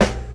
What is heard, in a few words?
strong bottom end snare drum sound b key; Duration 0:01; Samplerate 44100 Hz